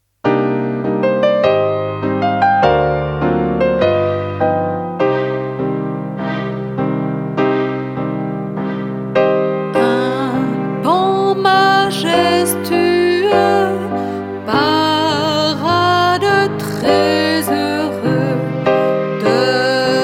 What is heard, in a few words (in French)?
Le Paon, Les animaux que j'aime; S-Active éditions